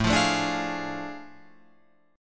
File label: A Major 7th Suspended 4th Sharp 5th, Guitar, Open F; AM7sus4#5 strummed; 9,12,9,9,11,12